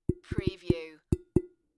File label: Tapping on a Glass Lampshade; beanstalk audio